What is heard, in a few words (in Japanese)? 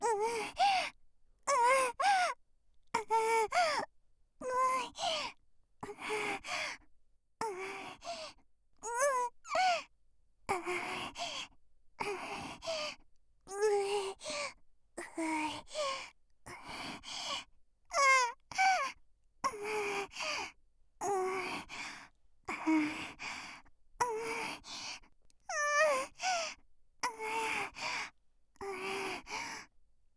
喘ぎ声(小)